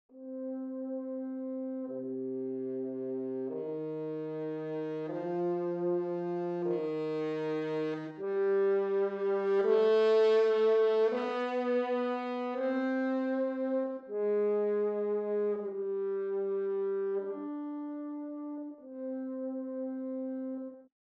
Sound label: Horn